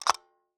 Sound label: Taxi Meter